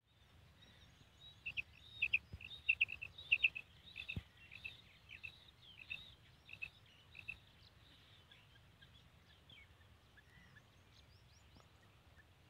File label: female quail sound; mada batair ki awaz